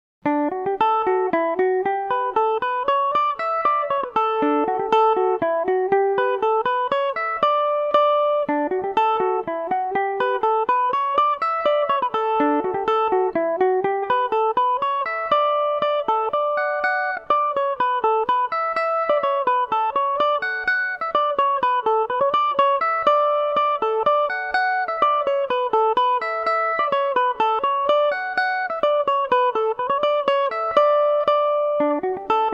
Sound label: herb reids dmaj, irish mandolin